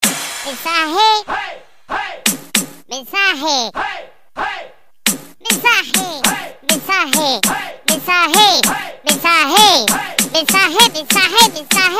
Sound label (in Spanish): ardilla reggaetonera; ringtones; sms